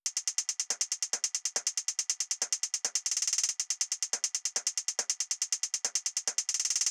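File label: drum Loop 140BPM closed hi-hat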